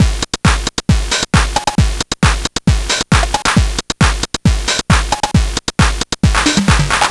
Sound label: Game Boy Techno Loop, Drums